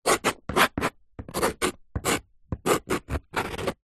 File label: food knife scrape vegetable cuttings plastic chopping board; zapsplat